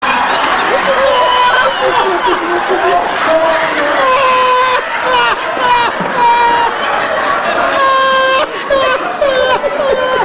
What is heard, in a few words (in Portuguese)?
risada; carlos alberto